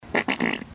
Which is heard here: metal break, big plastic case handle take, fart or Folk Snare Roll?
fart